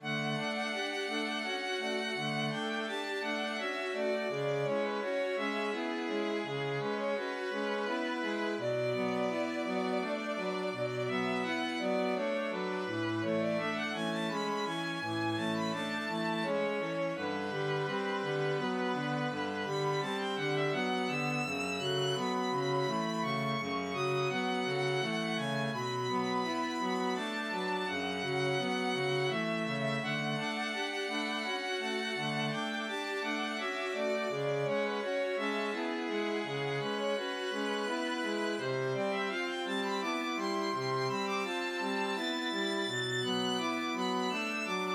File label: String Quartet III